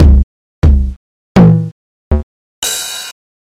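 barabannij nabor zvukov elektro bas, drum set sounds, electro bass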